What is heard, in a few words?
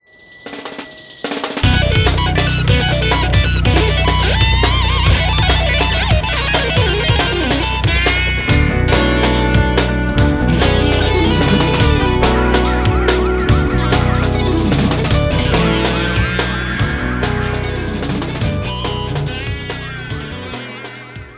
Solo